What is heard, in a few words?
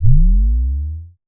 Clean Bass Bend